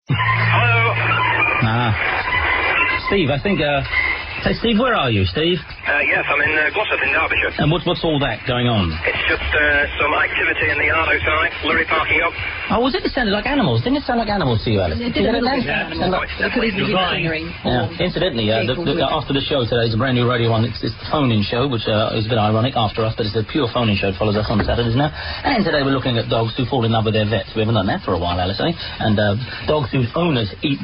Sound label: nail gun